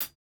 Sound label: hihat, house